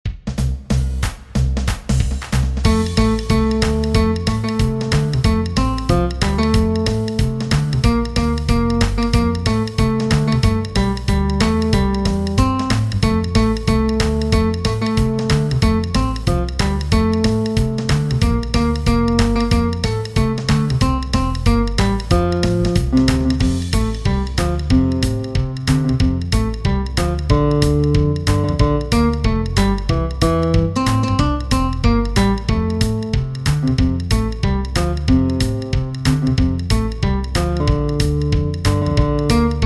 Jingle Bells Jazz Guitarra Punteo en Fa Mayor F; Tablatura con números

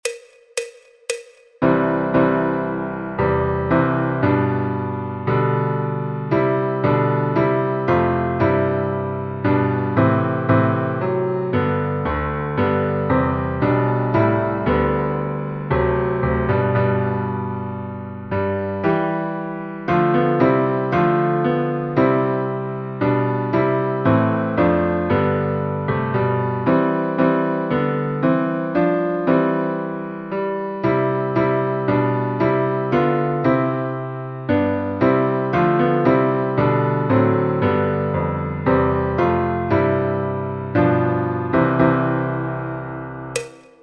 Adeste fideles Piano en Do Mayor C

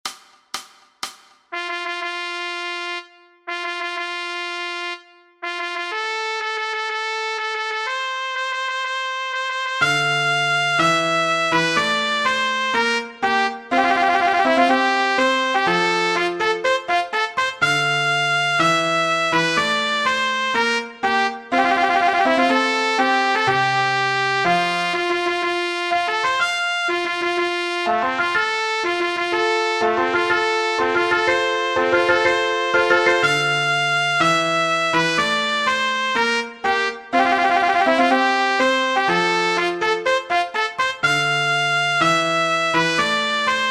Sol Mayor Trompeta y Fliscorno; Marcha Nupcial de Mendelssohn

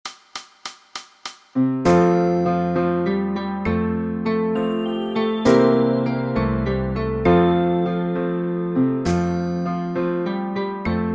Guitarra Punteo en Fa M Villancico; Cantando van los pastores

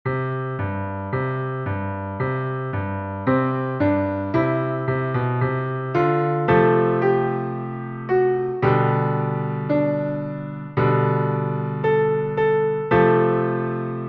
Himno de Andalucía Partitura de Piano Fácil con acordes para acompañar

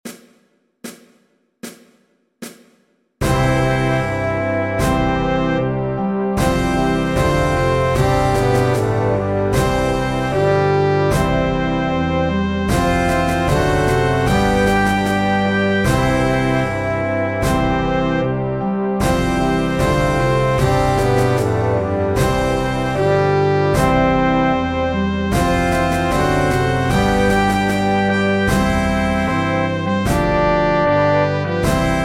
Himno de Espana Tuba y Contrabajo; en do mayor

